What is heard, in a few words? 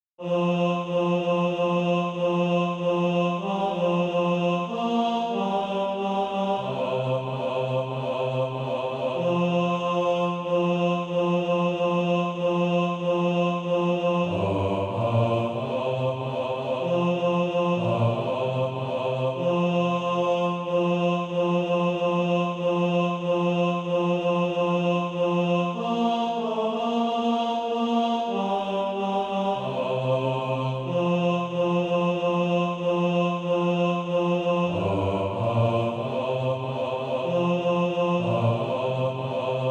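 bass, Sheet music (SATB) including Practise Music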